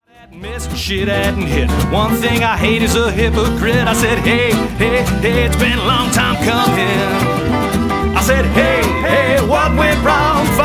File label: String Band